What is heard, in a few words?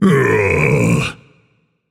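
non-verbal ugh; smite; atlas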